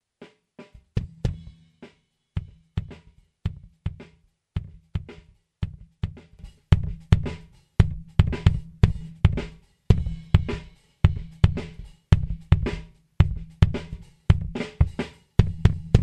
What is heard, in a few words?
Kick; Lindell Audio Compressor 7X-500